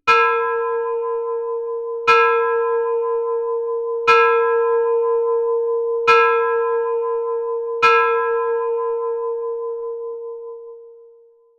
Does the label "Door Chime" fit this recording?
No